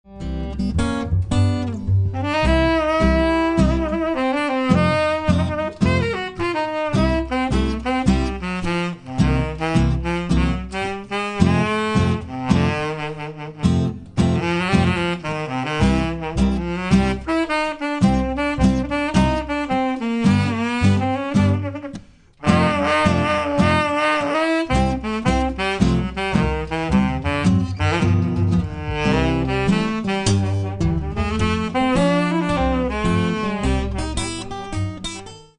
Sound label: sax